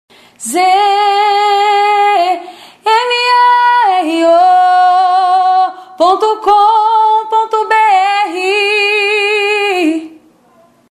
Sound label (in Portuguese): vinheta cantada